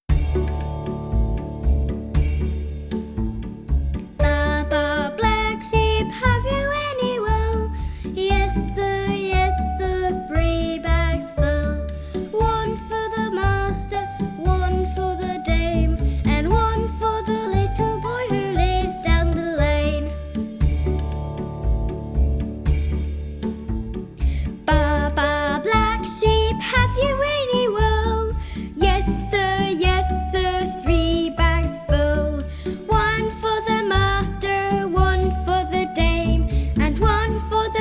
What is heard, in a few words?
Baa Baa Black Sheep